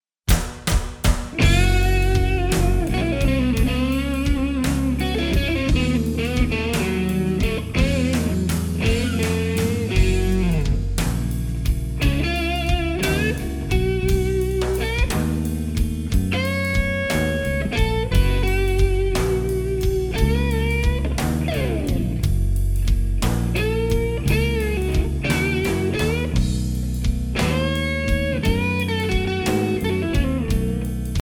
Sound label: Breaking Down Blues